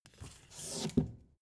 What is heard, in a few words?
take book